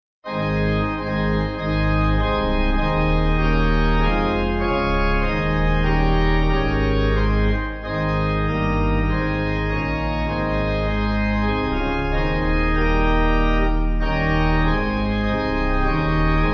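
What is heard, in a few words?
pipe organ hymn; Wiltshire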